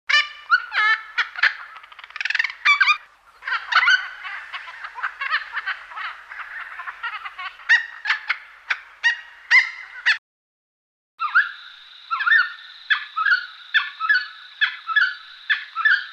noisy Friarbird; honeyeaters; canberra garden birds

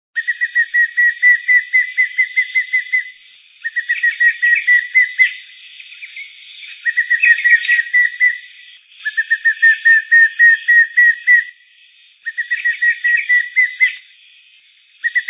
pallid Cuckoo; canberra garden birds

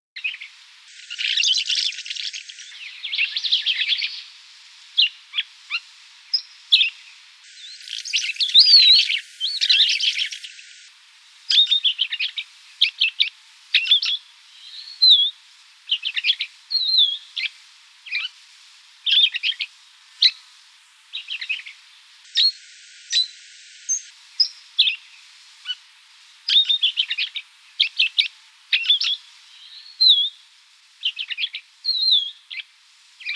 red Rumped Parrot, parrots

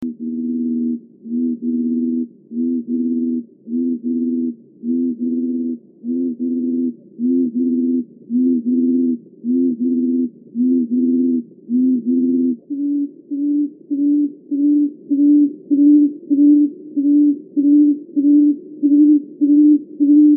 tawny Frogmouth, nocturnal birds; canberra garden birds

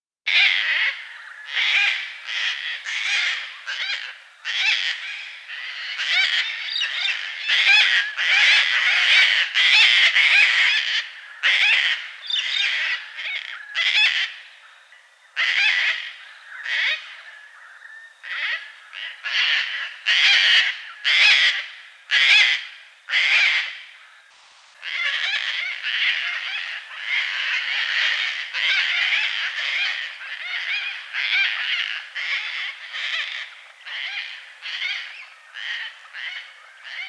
gang gang cockatoo

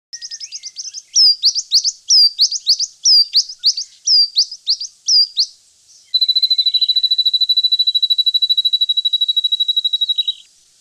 eastern Spinebill; honeyeaters; canberra garden birds

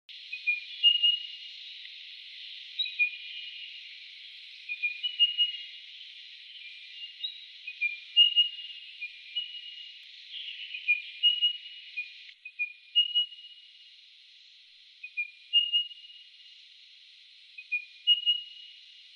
spotted Pardalote; pardalotes; canberra garden birds

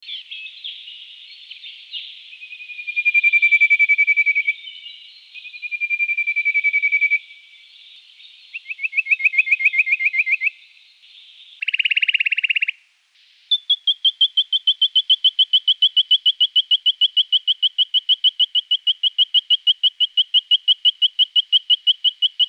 white Throated Treecreeper; treecreepers

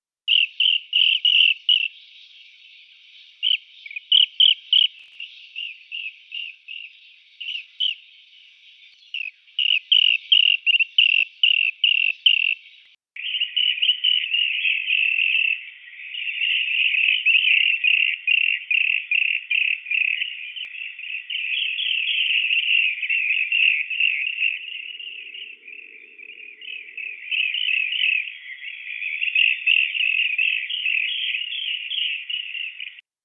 rainbow bee eater; kookaburra kingfishers bee eater and dollarbird

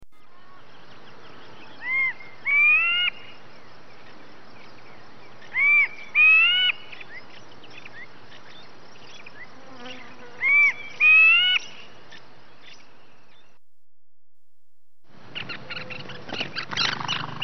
Brown, quail

